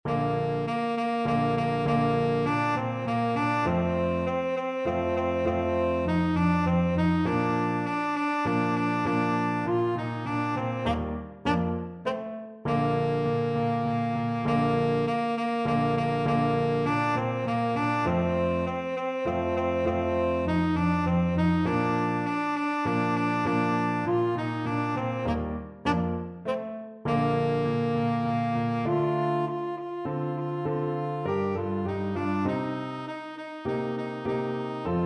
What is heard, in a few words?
fanfare, tenor saxophone